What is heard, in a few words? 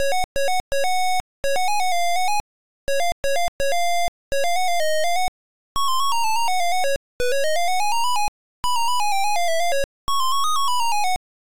MELODY